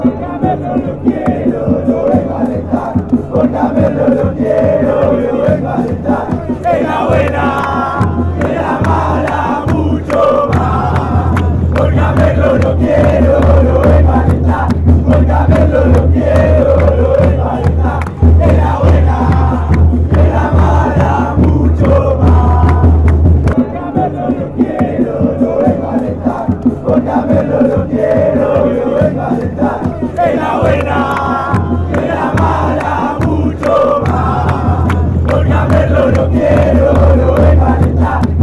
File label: chant; deportivo merlo